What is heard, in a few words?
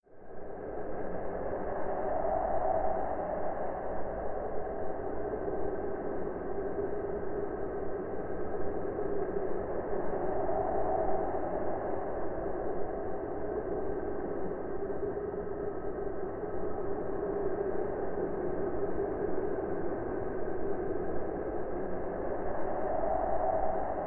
drone wind